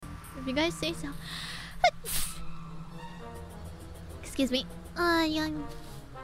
Sneeze

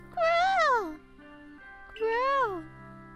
krooo

